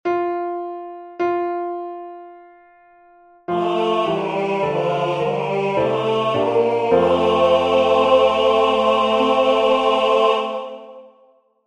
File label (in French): Tenors Voix; Final Agur Jaunak